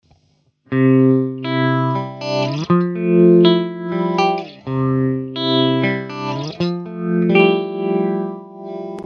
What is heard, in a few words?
Panned phaser